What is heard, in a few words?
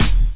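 snare drum bass drum, Dance Mega Drums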